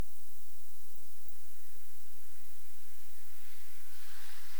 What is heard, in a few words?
Full Spectrum Plecotus auritus, bats